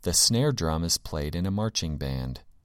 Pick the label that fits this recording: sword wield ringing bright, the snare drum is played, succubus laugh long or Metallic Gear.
the snare drum is played